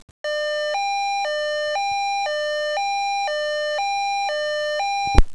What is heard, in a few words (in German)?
Piepser